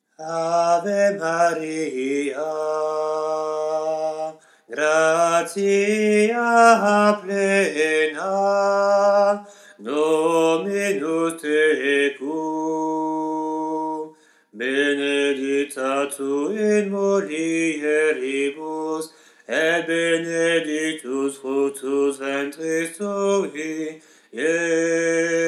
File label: soprane; ave maria oriental